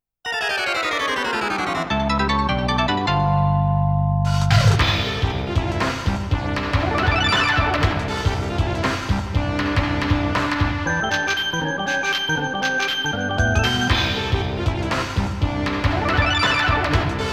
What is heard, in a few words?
Boss BGM; pulirula; Cliff Deterioration Version